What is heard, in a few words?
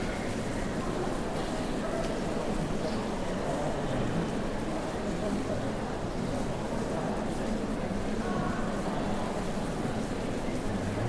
concert seats